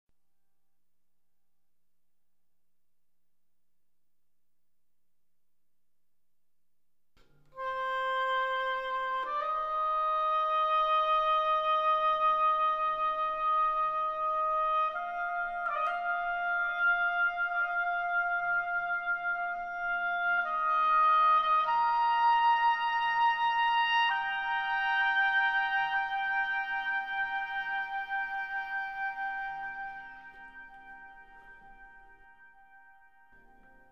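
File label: melody; Myst III Exile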